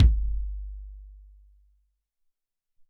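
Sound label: Tek Kick, Alesis SR-18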